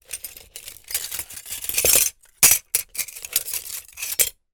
foley glass peices shards handling movement

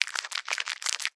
household shaving gel lather up in hands

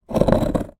foley large rock movement; zapsplat

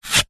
apple slice with knife; zapsplat

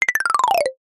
cartoon climb down descend fast steps ladder cute

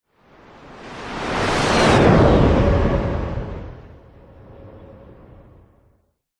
science fiction spacecraft flyby whizz past fast; zapsplat

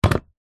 warfare gun handgun pistol set down on wood; zapsplat

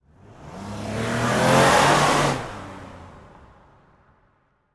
transport car drive away fast pass

